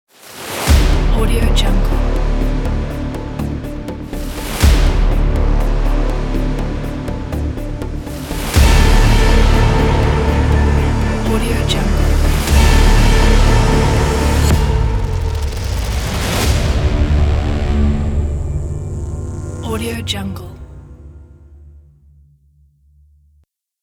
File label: impact cinematic logo intro